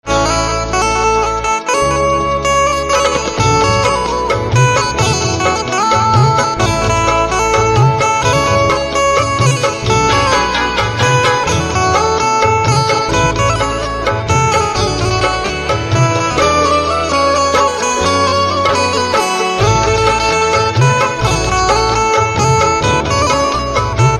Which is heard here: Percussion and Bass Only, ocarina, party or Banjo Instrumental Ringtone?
Banjo Instrumental Ringtone